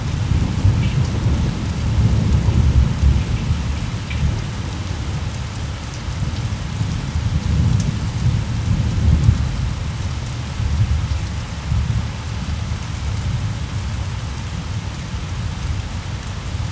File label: rain and thunder rumble